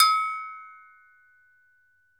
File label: TOKE BELL, African Perc